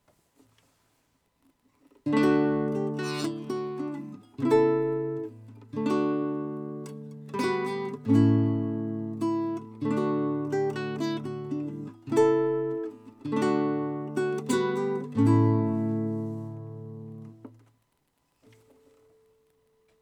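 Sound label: mic placement close to fingerboard, guitar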